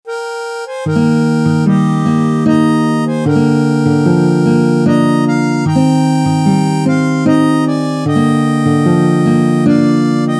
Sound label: Rock of Ages Harmonica